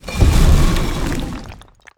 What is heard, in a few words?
War Golem Death